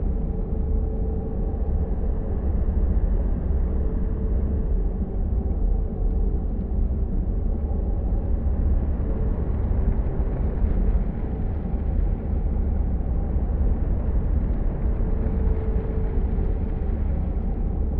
ship science ship idle; aquatics

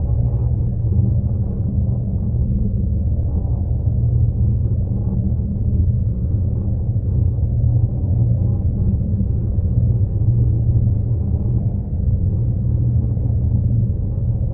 toxic world; Stellaris

